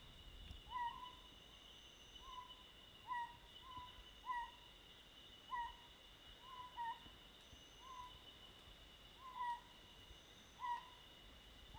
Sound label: Otus; bikegila; Martim Melo